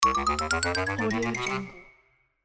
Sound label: cartoon xylophone clarinet run up accent